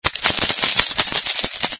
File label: chips